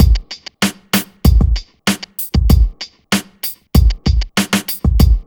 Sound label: BEAT, Grunge Hip Hop; USB Soundscan vol.34; AKAI